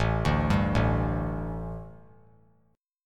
A 7th Suspended 4th, A, down; A7sus4 arpeggiated

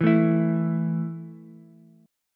A 5th A down Ukulele; strummed

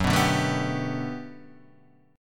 G Major 9th, F sharp

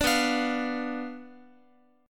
B Diminished B down Guitar; Bdim strummed

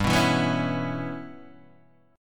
G 6th Flat 5th, Guitar, Open G

